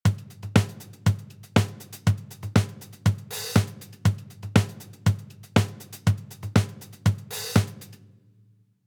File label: drums minimum phase